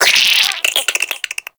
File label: Infested Minion Attack, Vocals; Warframe